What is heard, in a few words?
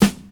fresh low tone snare g key